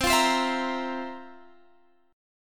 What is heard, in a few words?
F Major 7th Suspended 4th C